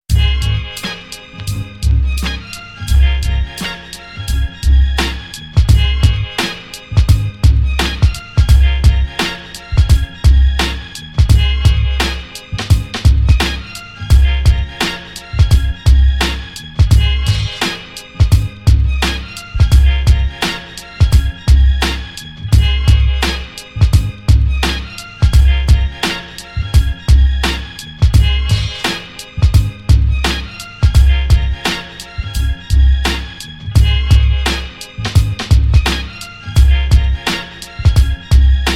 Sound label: beat